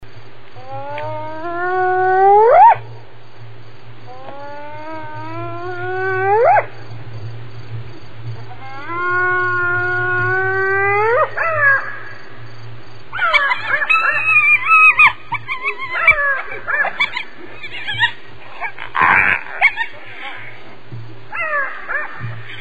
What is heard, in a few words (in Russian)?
zvuk gien v prirode